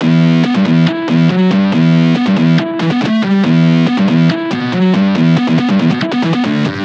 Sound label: Riddim Synth Loop E Scream (140); Ghosthack; Riddim Essentials 2020